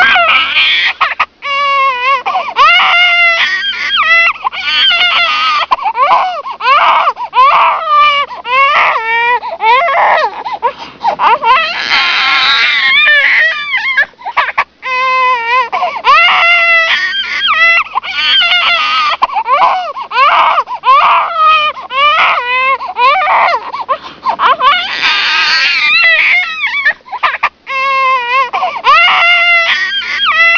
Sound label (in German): babygeschrei, sound effects, menschen; pinnacle; german